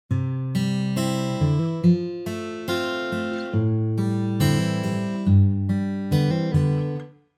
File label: guitar arpeggio midi